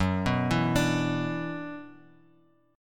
Gb 7th Suspended 4th Sharp 5th Gb Bass Standard, Gb7sus4#5 Chord; arpeggiated; JGuitar